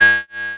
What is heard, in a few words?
Multimedia Internet CD-Rom Flash Beep Multiple